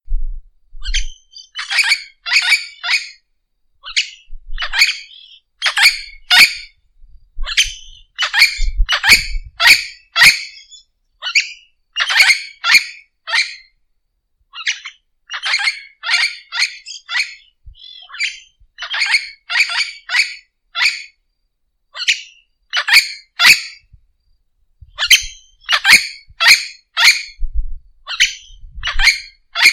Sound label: Orange winged amazon